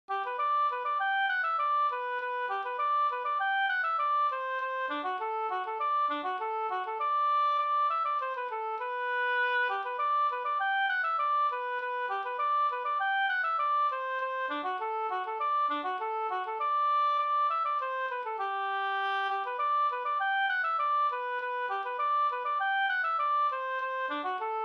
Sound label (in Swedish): snoa